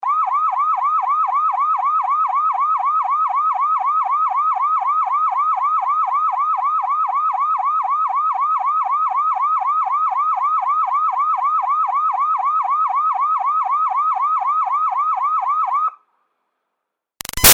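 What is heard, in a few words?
Siren Police Whooper Steady Medium Far; Emergency Vehicles; Big Room Sound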